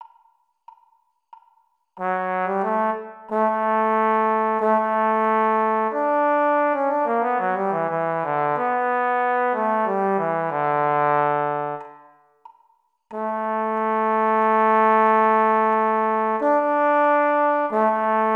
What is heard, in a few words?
trombone; All-County